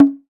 Indo Drum; Multisamples; Digital Sound Factory Planet Earth

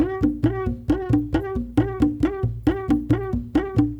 Ritual Drum

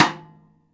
rim, Drums Acoustic; USB Soundscan vol.10; AKAI; 90s sample CD

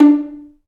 Vio Piz; Multisamples; Digital Sound Factory Virtuoso

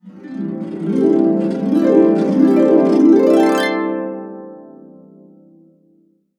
C CASC UP DN, Harps

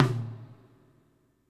FX TOM, Drums Acoustic; USB Soundscan vol.10; AKAI; 90s sample CD

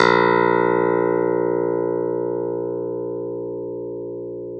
Clav DB A#2, Clav; Keyboards of The 60's and 70's

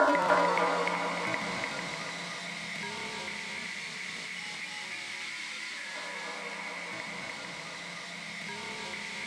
EL. CHIME, ELECTRO CHIME, Yamaha VP-1

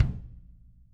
KICK, Drum Kits

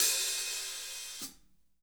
HAT OP CL, drum kits

